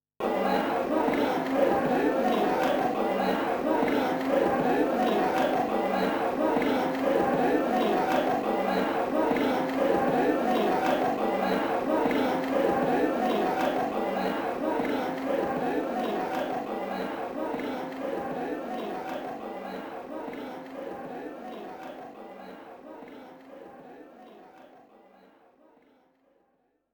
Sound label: Stage Introduction Ambient Music; snk arcade sound digital collection vol. 18; Akari Ichijo